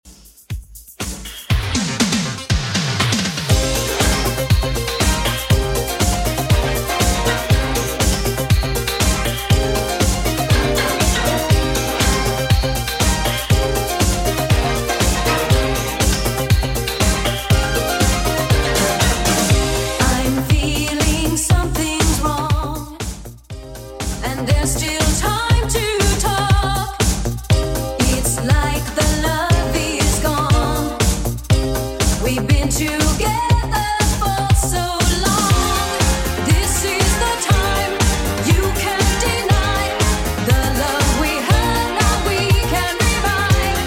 80s Redrum 120bpm

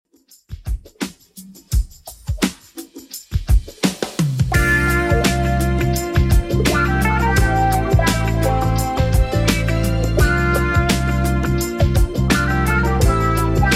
80s Redrum 85bpm

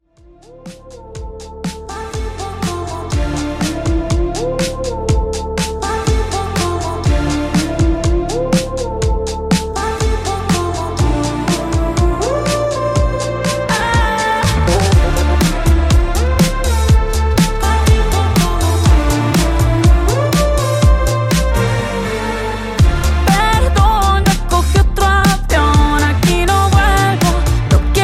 Redrum 122Bpm

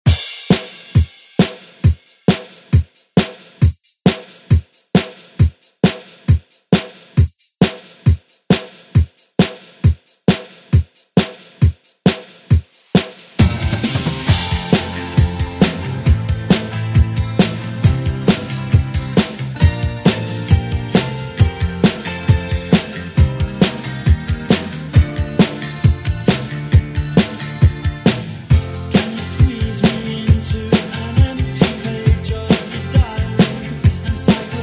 80s ReDrum; DJ Mhark

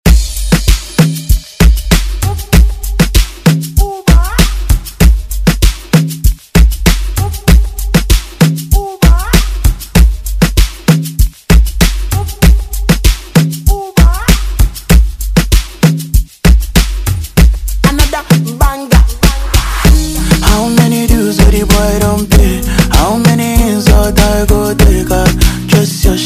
Afro Redrum

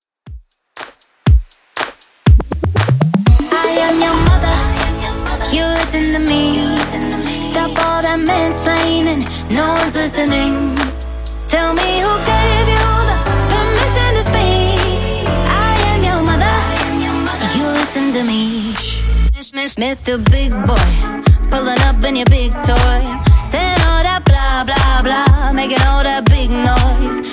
Top40 DJ Friendly Redrum 120bpm

